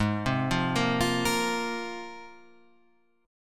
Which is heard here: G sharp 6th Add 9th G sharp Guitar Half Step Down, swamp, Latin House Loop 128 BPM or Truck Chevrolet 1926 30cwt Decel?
G sharp 6th Add 9th G sharp Guitar Half Step Down